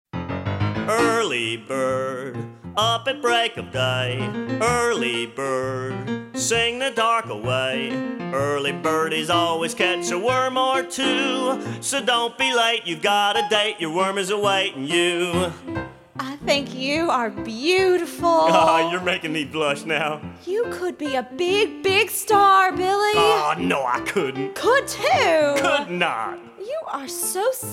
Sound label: Duet